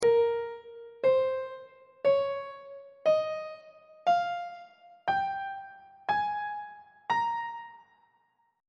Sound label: a sharp dorian mode note positions on treble clef